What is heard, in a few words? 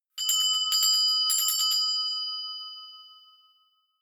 notification bell